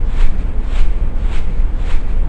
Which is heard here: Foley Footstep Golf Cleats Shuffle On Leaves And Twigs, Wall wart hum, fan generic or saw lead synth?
fan generic